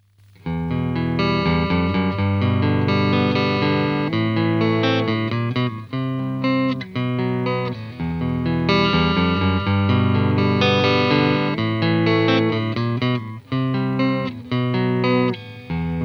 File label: Sound 6V6